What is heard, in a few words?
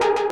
synth